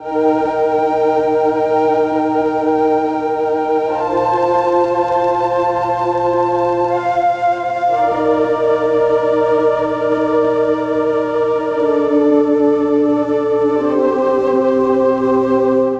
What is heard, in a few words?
loop viola